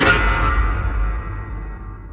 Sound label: multiline hit; DraculasCastleWD; wazdan